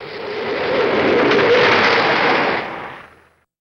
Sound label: Car Pitched Down Dirt